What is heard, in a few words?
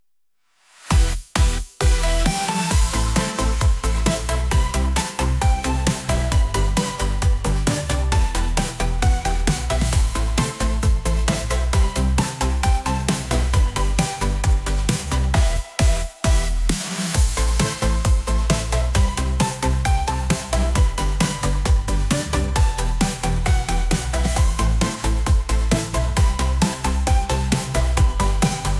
Bass Bot Glitch Goddess